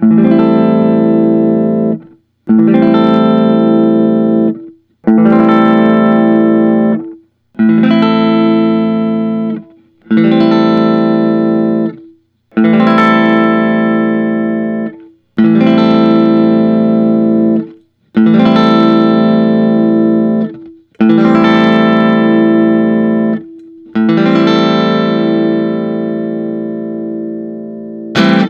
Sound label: parker fly deluxe ice blue burst